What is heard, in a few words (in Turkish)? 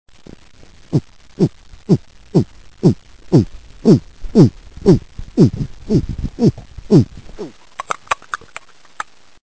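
kedi karın, hayvanlar; efekt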